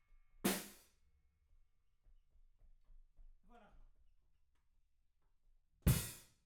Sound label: Room